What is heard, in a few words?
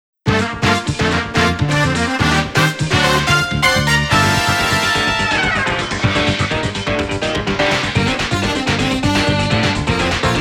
Boss Battle; Kirby Triple Deluxe; Bouncing Boss Battle